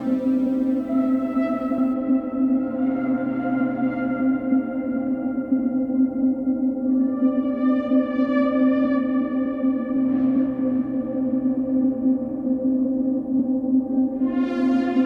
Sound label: Romance 155 Bpm